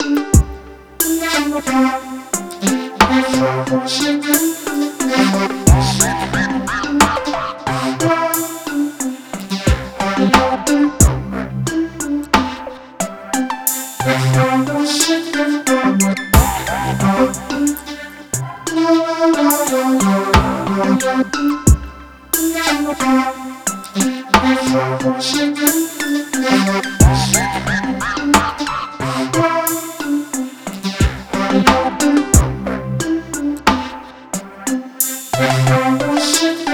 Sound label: MPC Vocoder; The MPC Session